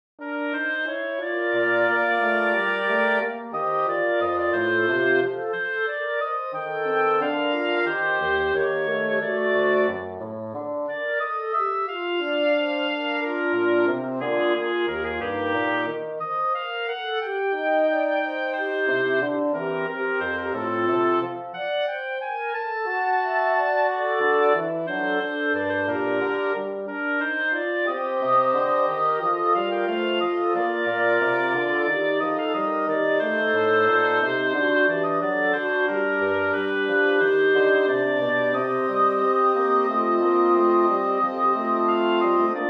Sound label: Short piece for wind quartet in Bb; to practice counterpoint